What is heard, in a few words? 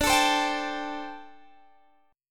D 7th Suspended 4th D Guitar Standard; D7sus4; x,17,19,17,20,17; strummed; capo=2